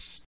hat; DRUMS (SINGLE HITS); Hats

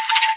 Fracture Perc, Found Percussion Hits; UNUSUAL KITS and FX; ModeAudio

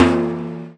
arabic perc, Arabic Percussion Kit; Arabic Percussion Kit 2

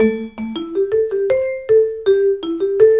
080 keep it simple vibes, keys organ piano loops

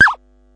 silly elec fx

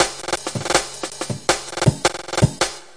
161 dnky brk, drum loops and breaks; 161 to 180 bpm

